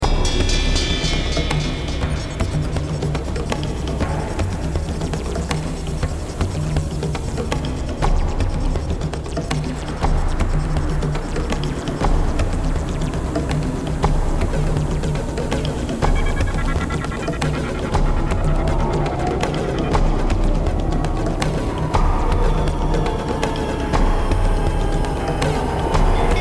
music danger; freelancer-hd-edition; sigma

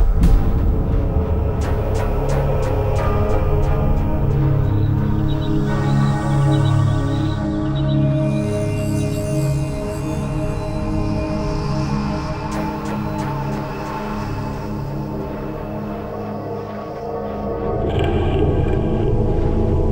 music space; br space

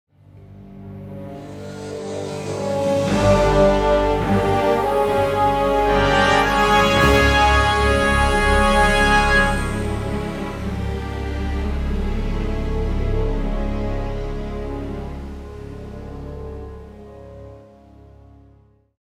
music; freelancer-hd-edition; crete

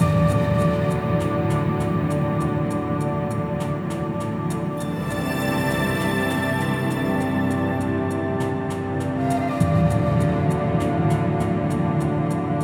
music friendly encourage; freelancer-hd-edition

